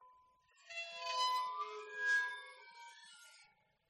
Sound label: waterphone long bows dynamic; aston stealth